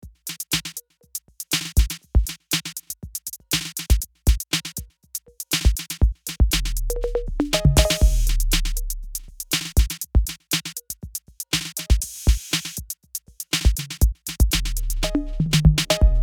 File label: 808 Groove